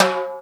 Percussion, House; Best Service; House Definition VOL-1; Drums

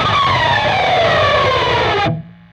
PRS SCRAP, Guitars; PRS SCRAPES

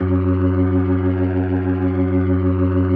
F#2F, B3, Vintage Keyboards

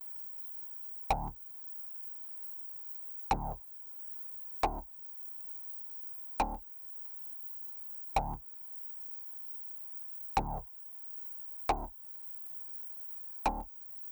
136 Bass; Zero-G Trance Inducer; Construction Kits; 136bpm

